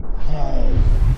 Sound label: Punch Right Speed Up; Thug1; Pitch Black - A Detective's Demise